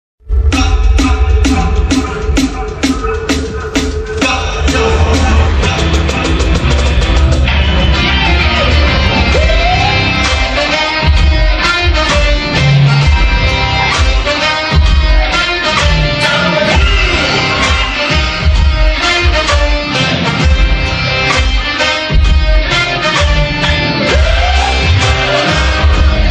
interval bgm; thalapathy vijay

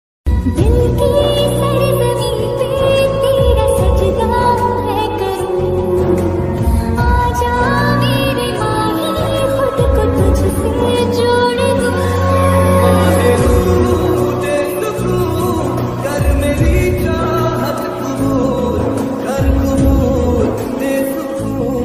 romantic ringtone

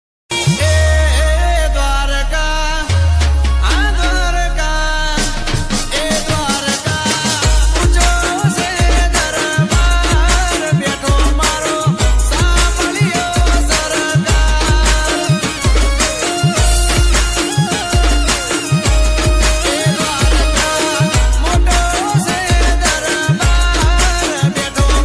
gujarati ringtone

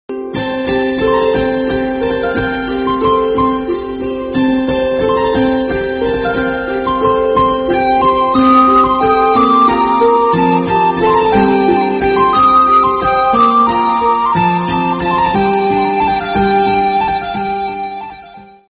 bgm tamil

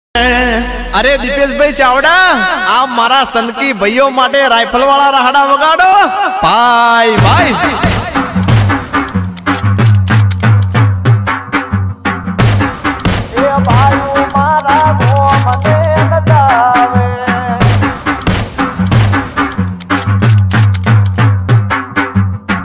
gujarati song

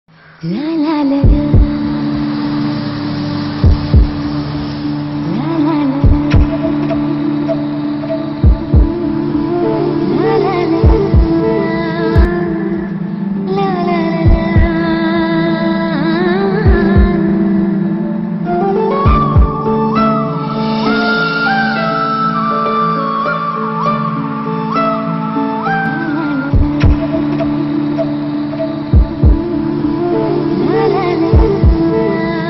romantic bgm ringtone telugu movie bgm; dangerous khiladi 5 movie; endukante premanta telugu movie bgm; ram tamanna

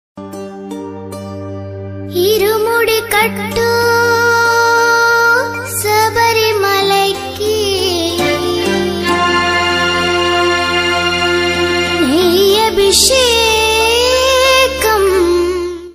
ayyappa beautiful song in telugu and ringtone

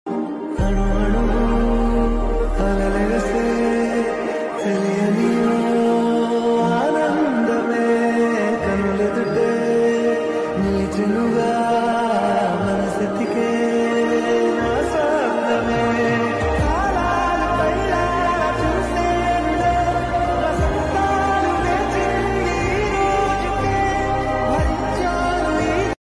song telugu; om bheem bush

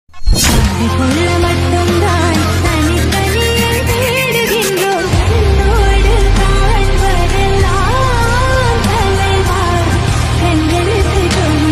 slowed reverb; jailer; jeans movie

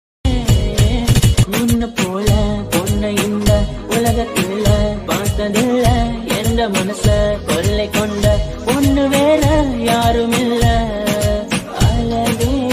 Tamil album song

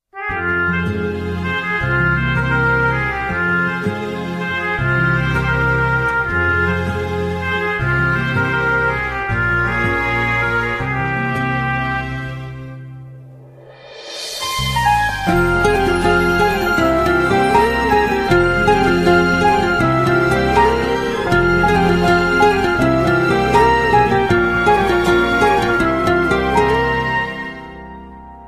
love bgm ringtone; ethir neechal movie

